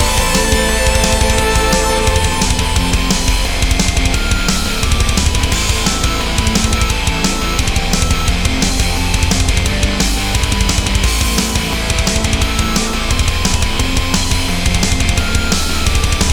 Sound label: egypt loop; unreleased